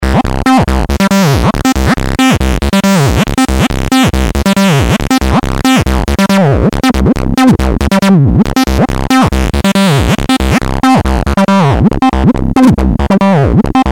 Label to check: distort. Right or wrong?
Right